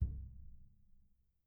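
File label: pedal Up, Grand Piano; bitKlavier Grand; Piano Bar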